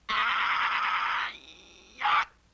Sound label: LoFi Synthetic Squawk